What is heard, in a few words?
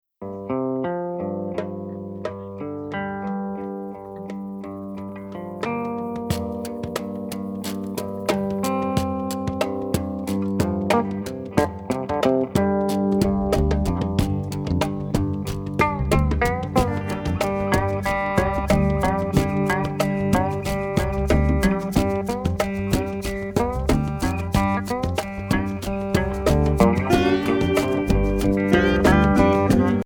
groove in g